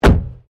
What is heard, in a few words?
Wreck Car Small Hatch Close; Wrecked Cars, impacts, crashes, scrapes; Objects